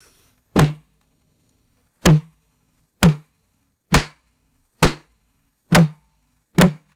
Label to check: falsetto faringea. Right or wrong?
Wrong